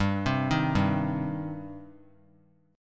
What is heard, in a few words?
G Suspended 4th Sharp 5th G, down; Gsus4#5 arpeggiated; JGuitar